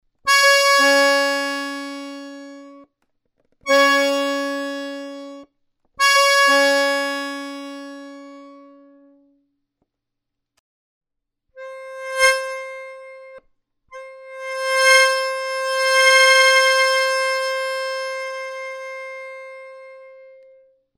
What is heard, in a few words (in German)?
reed response tutti real